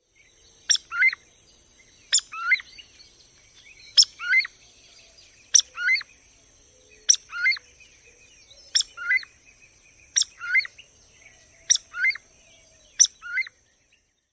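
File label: typical calls, bird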